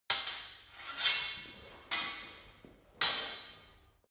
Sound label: sword hit sword sequence